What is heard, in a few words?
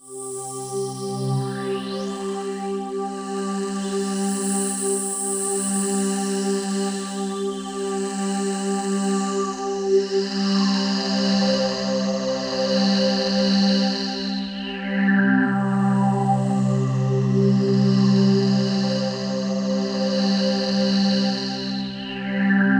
POLY SAW, Roland JV 2080, C3